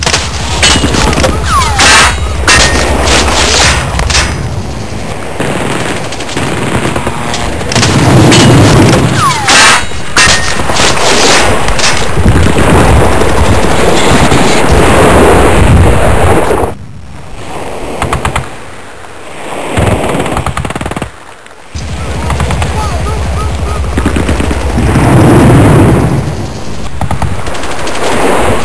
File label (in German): wasser; firearms